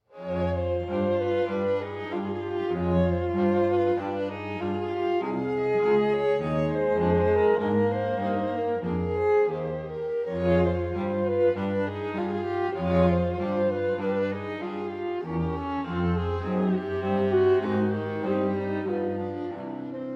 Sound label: string quartet in c minor allegretto molto moderato e comodo